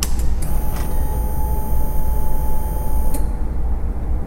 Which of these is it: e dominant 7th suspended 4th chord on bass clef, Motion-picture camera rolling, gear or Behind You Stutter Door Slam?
gear